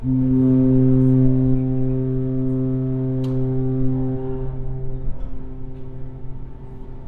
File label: Ships Horn